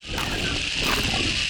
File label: VOLCANIC GRUMBLE PEACE IDLE